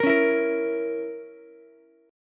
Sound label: Bb Diminished Bb down Ukulele; strummed; chord shape